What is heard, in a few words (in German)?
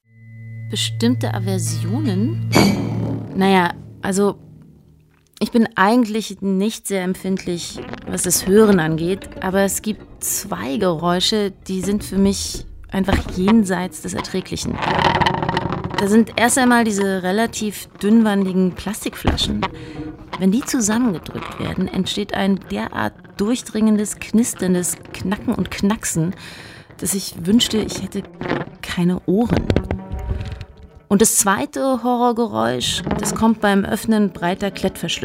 knacks ratsch knacks linear